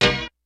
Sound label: HIPHOP HORNZ; Kid Nepro - Elite